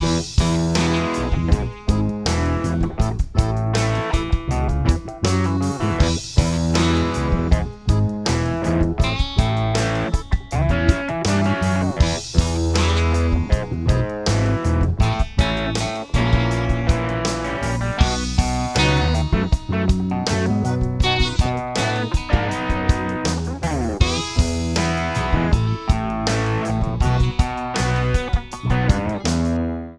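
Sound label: roots accordion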